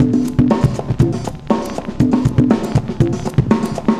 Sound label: cooling 120, loops, 120bpm